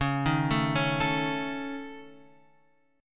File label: C sharp Suspended 2nd Sharp 5th, C sharp